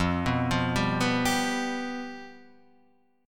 F Suspended 2nd Flat 5th, Guitar, Drop D

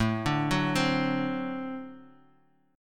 D 7th, A

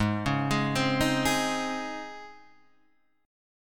C sharp Major 7th Suspended 2nd, G sharp

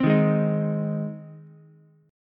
Ab Major Ab down Ukulele; strummed; JGuitar

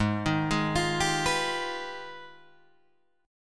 G sharp Minor Major 13th, Guitar DADGAD; G#mM13 arpeggiated

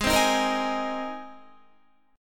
Ab Major Add 9th Ab, Guitar; strummed; down; 4F,4A,5C,5G,5C,6E

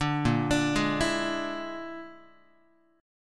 Bb 7th Flat 5th, down, Guitar; 5,6,5,6,8,x; 4A,4E,5A,5D,5Gsharp,6Csharp; Bb7b5 arpeggiated; JGuitar

